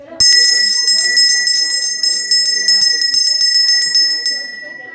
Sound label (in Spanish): Sonido de campana